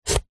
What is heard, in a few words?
apple chop knife wooden chopping board